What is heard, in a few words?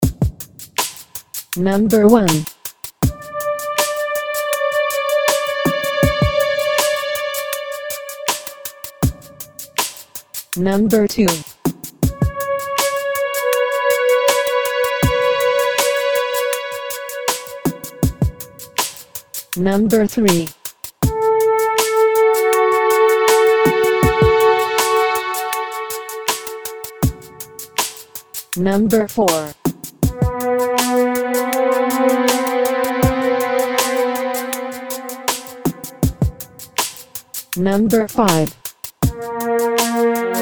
intervals m3 M3